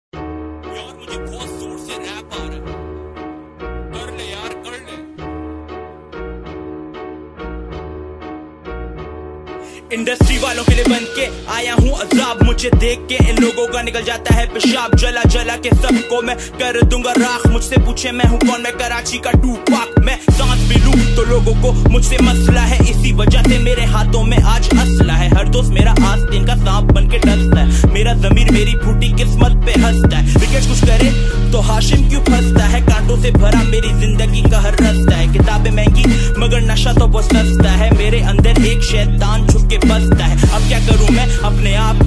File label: The Rap Song